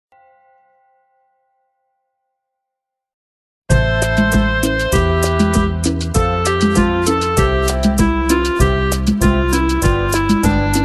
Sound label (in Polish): piosenka